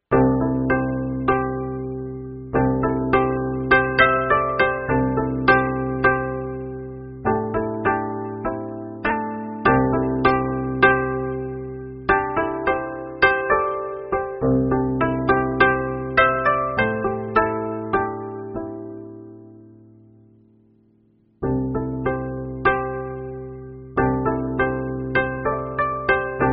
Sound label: ORIENTAL MUSIC Singapore Traditional Melody